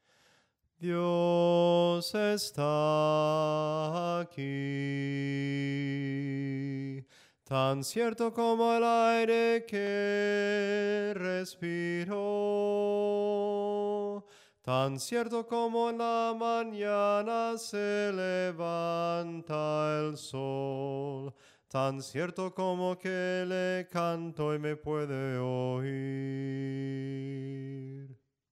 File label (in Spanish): SOPRANO CANTADO